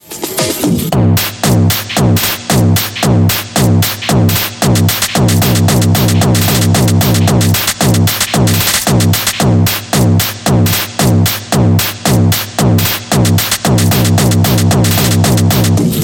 Techno music 90s

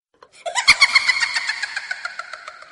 Ghost With Sound Horror Notification

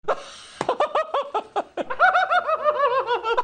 laugh; Tucker carlson

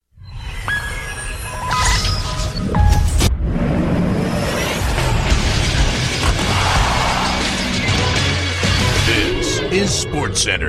sports news bgm; Espn

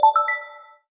Xperia Notification Sound

